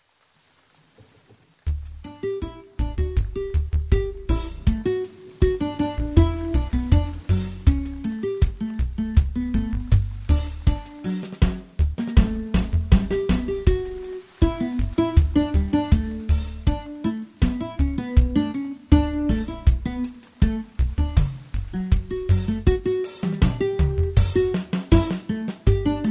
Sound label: Rainy Day Guitar